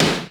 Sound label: R50E SD; Crypton - DrumBase5000; RZ1